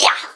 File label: Rogue Attack; Pecheneg; Folk Hero